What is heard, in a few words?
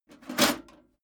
metal plate armor movement single